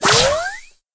stun throw

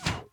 throw

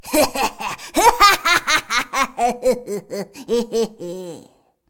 laugh, zh; Fizz

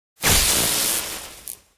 Emote Shocked; Edragon

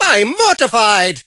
mortis hurt

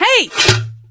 bazooka

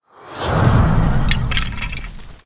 Witch Magic Stick

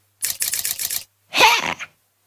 Mother Witch Knitting

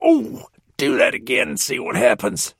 pain response

